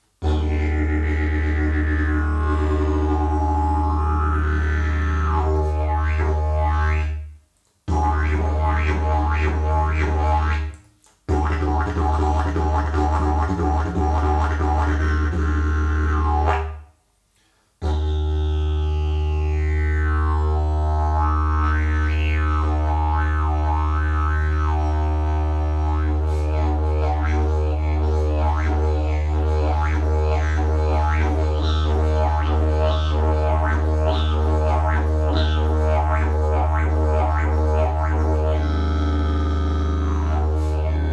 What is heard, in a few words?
didgeridoo; jasan vruborez